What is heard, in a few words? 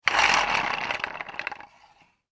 toy tricycle wheel spin air, Toy Wooden Tricycle Wheel Being Spun In Air; Miscellaneous Cartoon; Cartoons